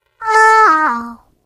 turret tipped

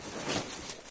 foot slide, zombie; Source Engine; video game; npc

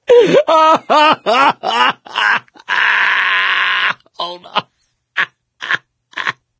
laughter, mechanic, survivor, voice; source engine; left 4 dead 2

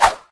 taunt yeti standee swing; video games; source engine; tf

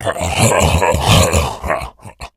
shoved, npc infected

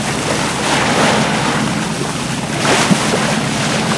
pontoon fast water loop, airboat; Source Engine; video game; vehicles

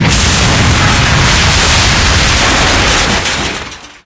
tank explode; video games; source engine; tf; mvm

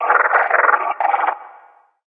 advisor screen; episodic; Source engine

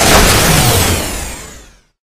bumper car spawn; tf

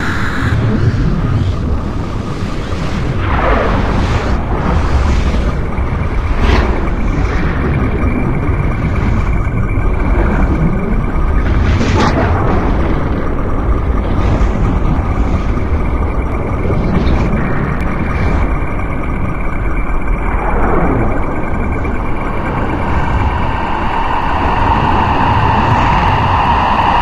ambient; video games; outro; source engine; episodic

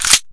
sniper bolt forward, weapons

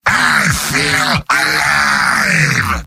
heavy positive vocalization; mvm

